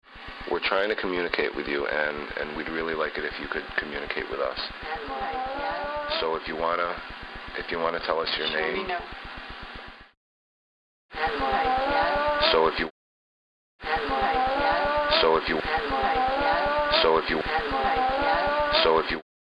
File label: house moan